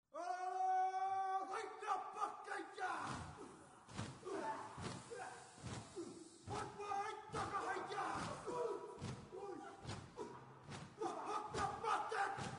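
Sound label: maori background music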